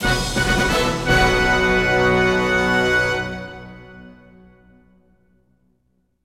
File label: Combat Win; The Hobbit; PS2